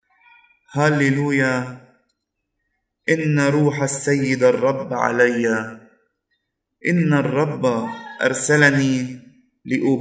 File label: Gospel Antiphon; Sunday Advent